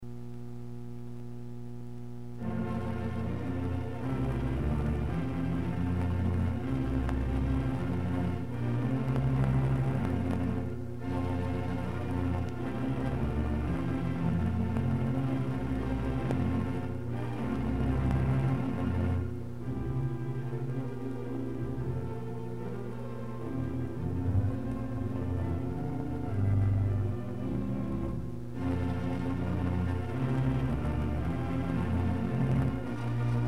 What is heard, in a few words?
Christmas Pipes and Chimes Deck the Halls, organ